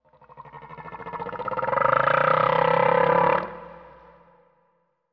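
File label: Animal Stinger Eerie; stingers & whooshes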